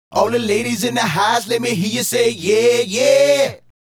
Male Group, Hip-Hop and RnB Vocals

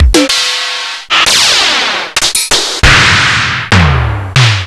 Korg KRZ

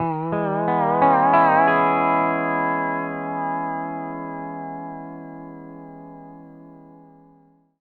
PD LOOPS 140, Whole Lotta Country

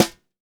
sonor snare

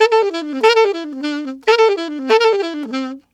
Alto in A min, Sax; Sonic Foundry (Sony Creative Software)

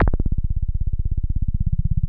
TB 303 BASS; BASS SOUNDS

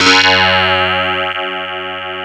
DS8 F#2, Korg; Back In Time Records; Korg Universe VOL-1

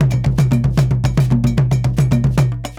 Mai, full ensemble, world percussion; Mai - Casse

